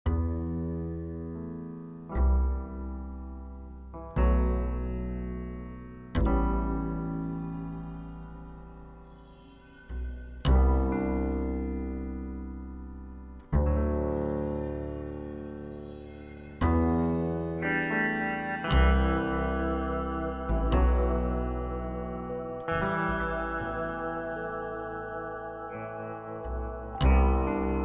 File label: cracked concrete melancholic